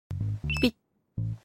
Beep

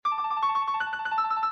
Shortest Sms

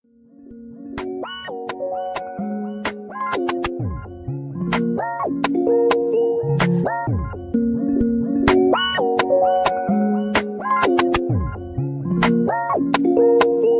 beat

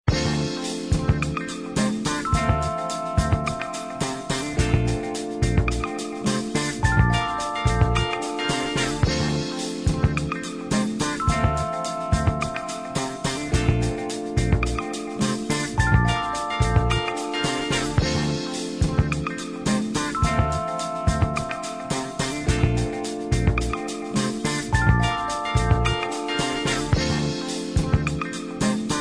Disco

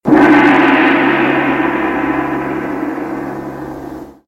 Gong

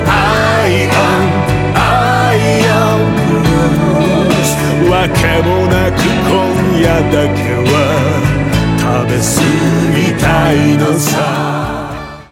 Iron Blues

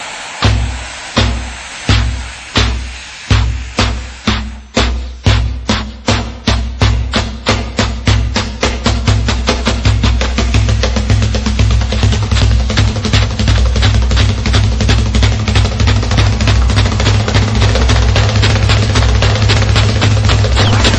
Drum Beats; Nike

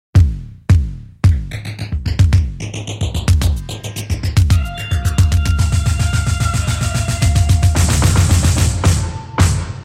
Tone Industrial

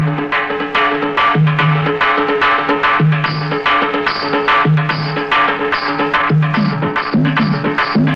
Drums

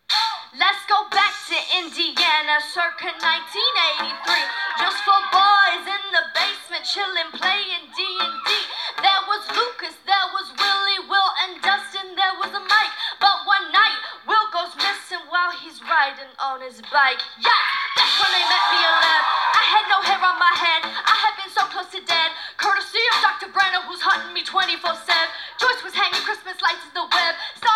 rap

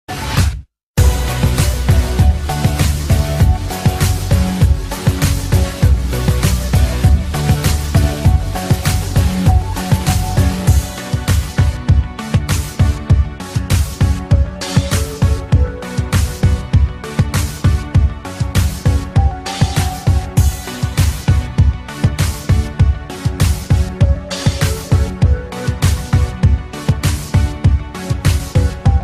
Instrument

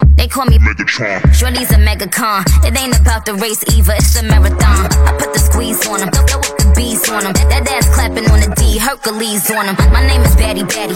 rap; Megatron

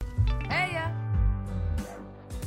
jingle

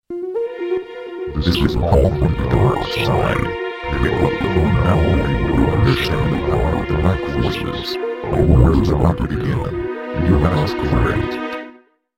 Scary

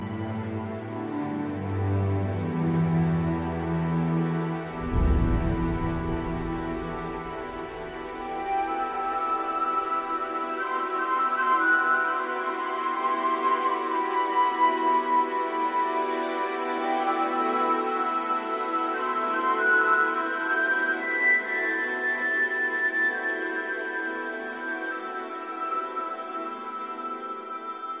Ambient

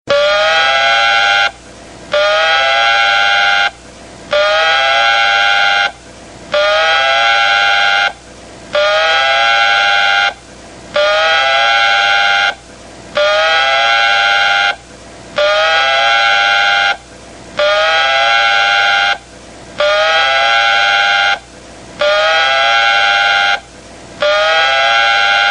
Loud Office Siren

